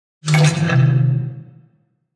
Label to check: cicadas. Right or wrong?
Wrong